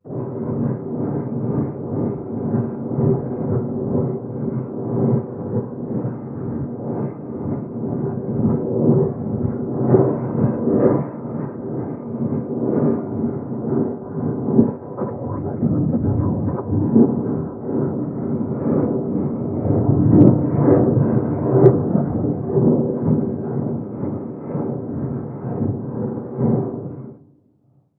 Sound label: converting